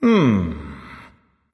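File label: thinking, nonverbal; smite; khepri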